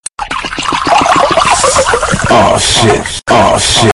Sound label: Water Msg